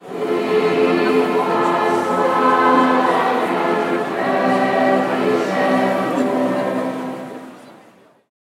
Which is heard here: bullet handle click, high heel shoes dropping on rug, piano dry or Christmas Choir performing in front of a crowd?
Christmas Choir performing in front of a crowd